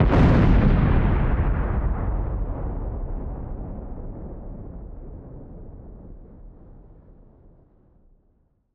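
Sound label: explosion extra norm deep; weapons; starruler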